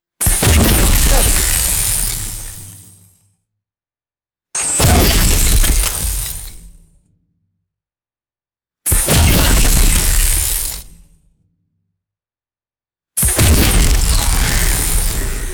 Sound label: heavy impacts with electrical zaps and debris; explosions